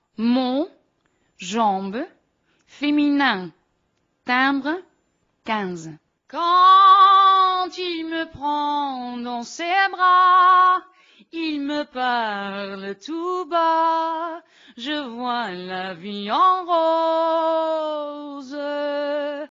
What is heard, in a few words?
vocals